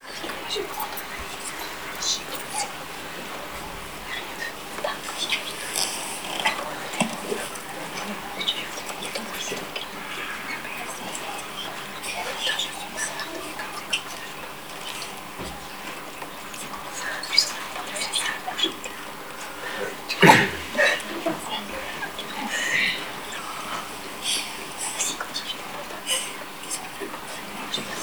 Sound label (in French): Chuchottements Jeunes; French; Crowds